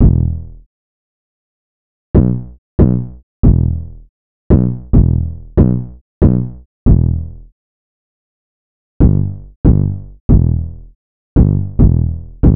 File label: 808 loop 140 Em; Slate Digital BASSHOLE Sample Pack